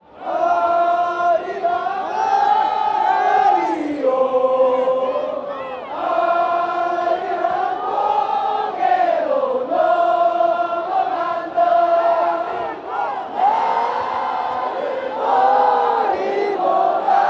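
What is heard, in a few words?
ooh ooh, football fan chants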